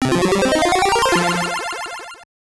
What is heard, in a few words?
Tornado Bonus; Supercross Freestyle 2000; GBC